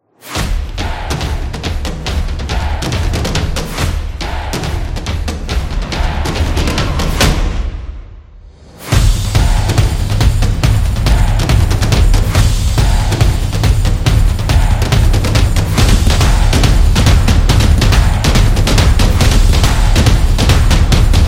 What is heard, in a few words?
The Sport Beat